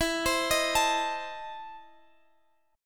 E Major 7th Suspended 4th Sharp 5th, Guitar, Standard; EM7sus4#5 arpeggiated